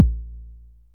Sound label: huge bass drum single hit a sharp key, kick drum sample tuned to the A# note; kick drum samples